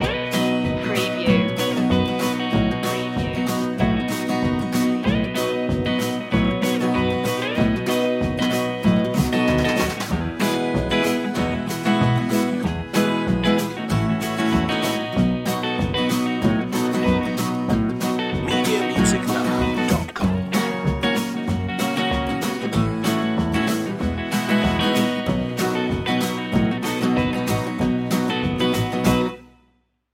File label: rhinestone rambler loop